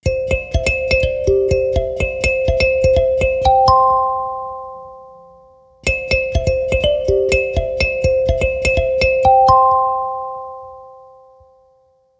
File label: Stereo Pure Tone, ringtones; Lenovo; ZUI-13